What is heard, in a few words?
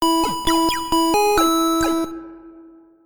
healing; Pokemon Essentials; Pokémon Estelar